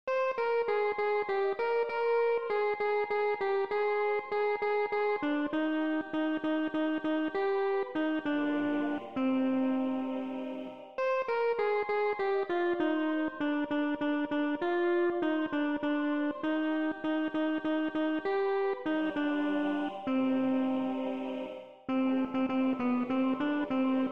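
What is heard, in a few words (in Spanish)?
Contraltos, MIDI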